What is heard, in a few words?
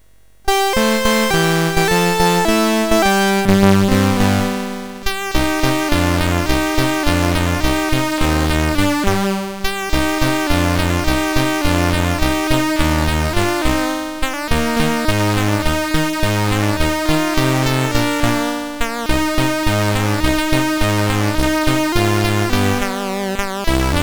mus MOS6581R2; Ruths Song; Pirates of Penzance